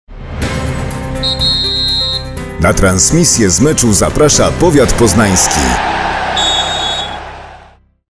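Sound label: jingle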